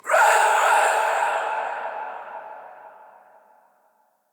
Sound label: Ghost Pain, Vocals; Characters; Enemy; Nightfold Test Range Ghosts